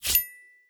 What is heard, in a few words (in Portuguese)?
feedback positivo